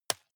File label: juggle catch medium